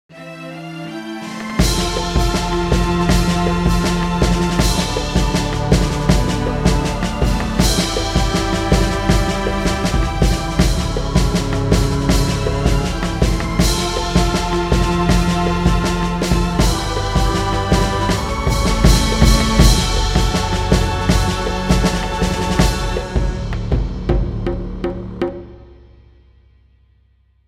Orchestral Waters

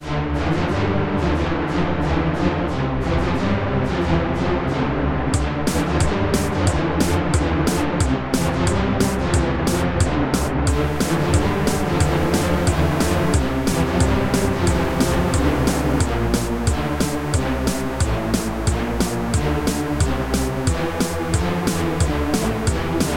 Drums Running

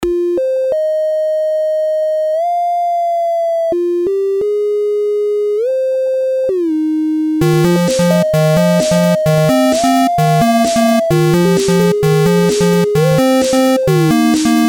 Chiptune Lab

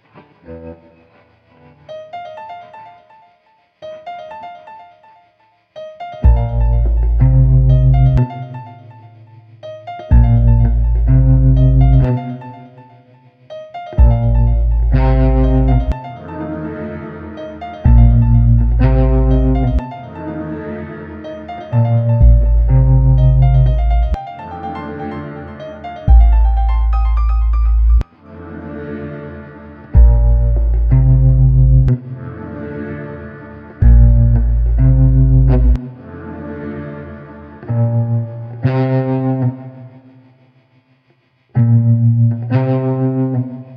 ambient

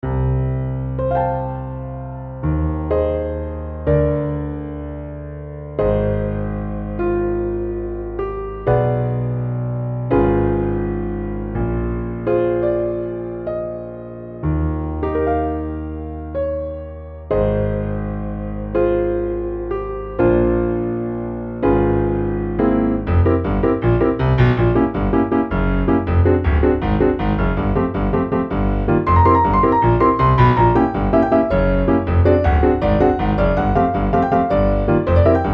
dance sing rain indie solo

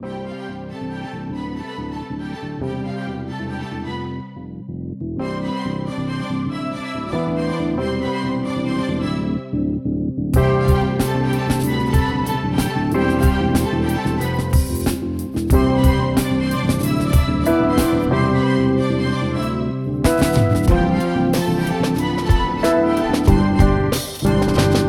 Grass Land electro remix; Super Mario Bros 3

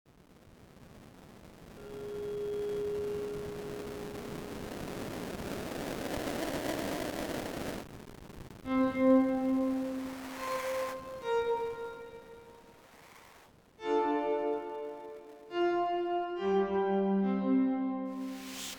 Ambient Ruins Music

